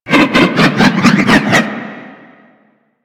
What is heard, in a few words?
Hammer Weapon Throw Loop; Hammer Boss